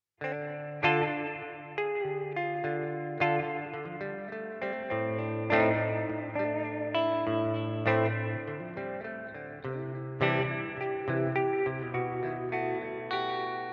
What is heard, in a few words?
Plate Reverb Source Audio Ventris